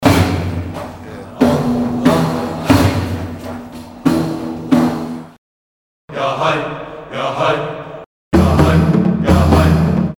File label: oriental composer